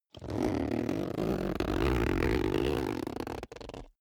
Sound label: zipper backpack close slow